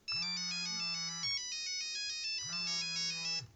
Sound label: nokia 5510 ringtones improvisation